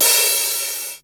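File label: hi-hat rock open, Yamaha Motif ES drum machine; 300 Drum Machines; Yamaha Motif ES-Series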